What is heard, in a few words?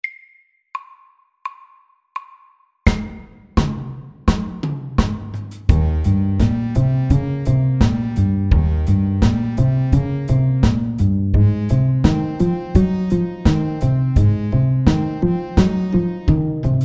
Walking bass, 85